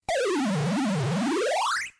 NES Level Clear Theme

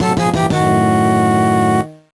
Message From Bowser; SMA4

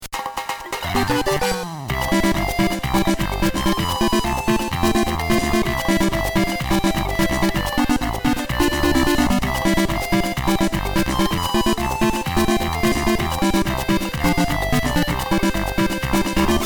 Mini-Game Theme; MPA

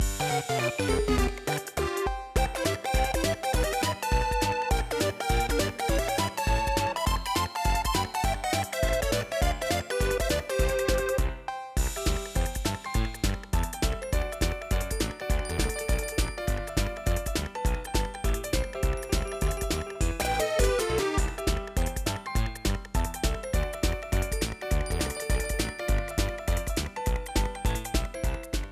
Spinning-Door Game, A song

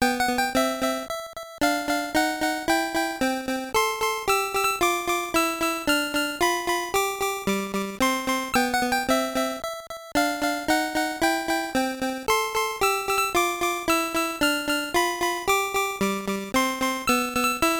Ending, Music; SMB3

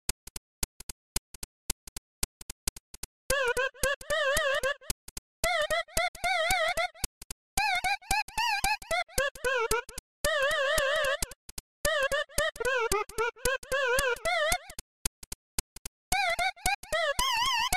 Title Wobble; Mario Paint

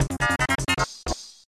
Bonus Lose

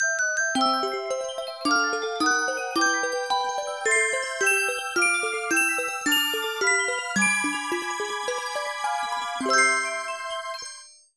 Ending; NSMB; List of New Super Mario Bros. media

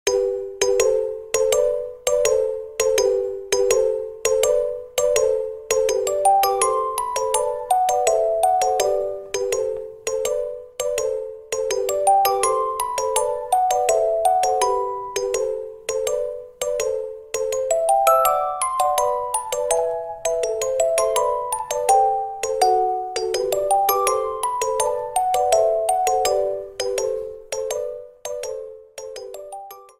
Snow Night theme; SMM2; SMB

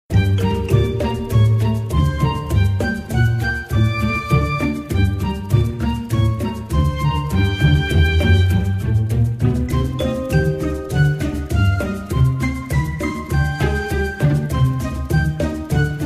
Hostile Intrusion Music; Haunted Towers

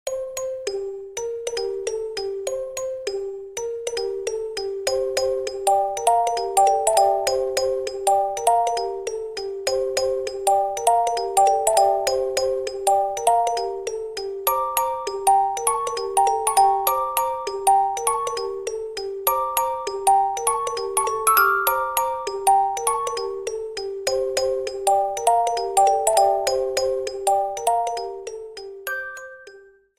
Forest Night; SMM2; SMB

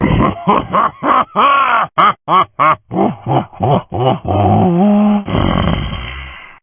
evil laugh; Wizpig

